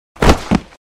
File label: Landing; Blockman Go; Android